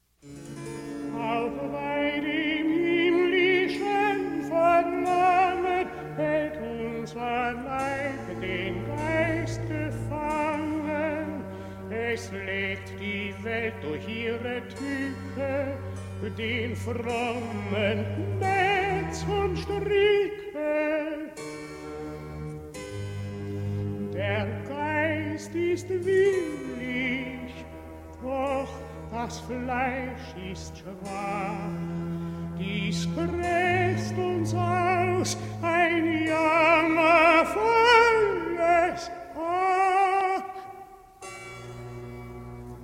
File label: Cantata BWV 70 Recitative, tenor